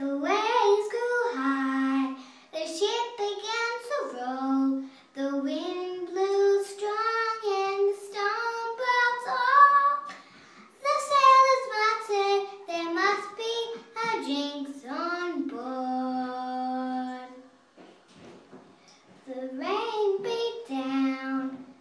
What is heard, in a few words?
singing in the bath